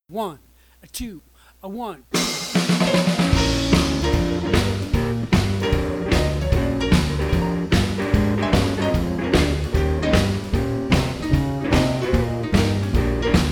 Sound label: pentatonic major tracks, saxophone